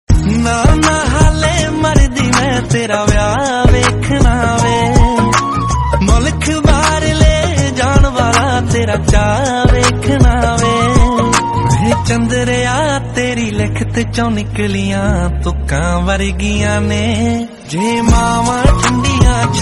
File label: punjabi